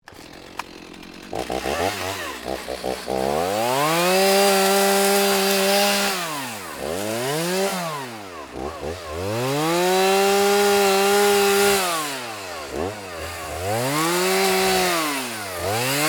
Chainsaw Cut Brush, Industry, Chainsaw, Cut, Brush, Large, Tree, Sequence; SoundEffects-BlastWave